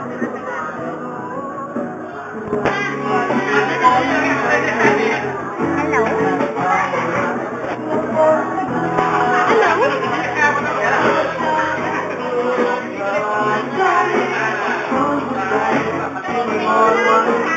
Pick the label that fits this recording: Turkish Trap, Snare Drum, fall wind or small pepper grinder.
fall wind